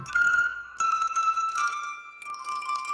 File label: cartoon fail; sound effects